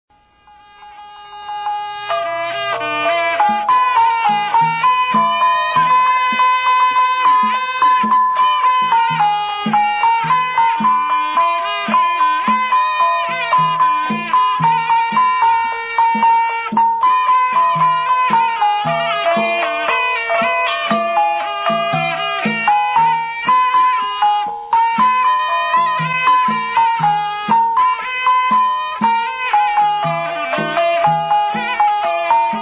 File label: Lao Instrumental Music; Pii Hmong (Flute); Xamtai/1999; Hmong Lay Folksong: Tueoti